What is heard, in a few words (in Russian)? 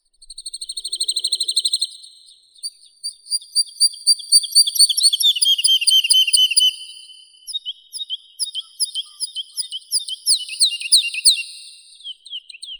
zhavoronok lesnoi lullula arborea, zvuki prirodi